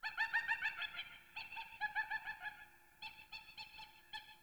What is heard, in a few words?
Prairie Day Distant Bird; Ambience